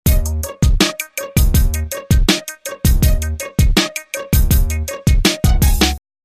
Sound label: Robotic